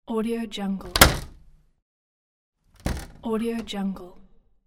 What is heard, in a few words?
door closed